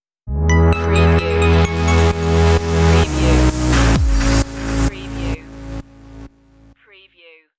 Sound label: the club stinger